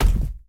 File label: Obstacle Instruments Box